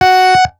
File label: Bass F#4, Synths; Multisamples; Mamas Bass; Ableton Live 12 Lite; Core Library